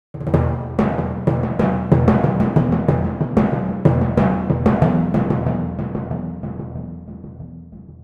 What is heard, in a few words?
Timp erollin; Torchlight III; PS4